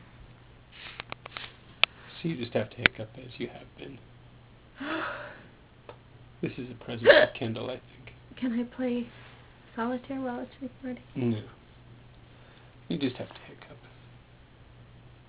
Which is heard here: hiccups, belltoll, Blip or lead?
hiccups